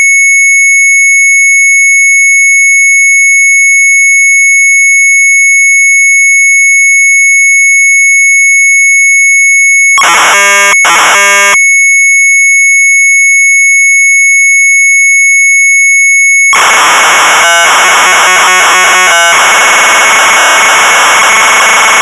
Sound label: MZ800 Tape, sharp; AIROPLOF; retromelsarchive